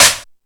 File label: Crash, Drum Machines E-MU Pro-Cussion